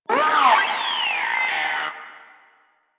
Maggot Bark; Enemies; Vocals; Warframe; Proto Infested

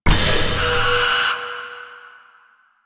Owl Recon Item Found; Duviri

